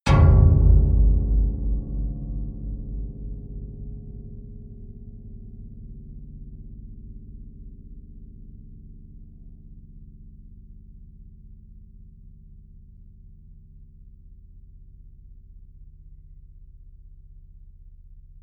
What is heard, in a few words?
piano sustained deep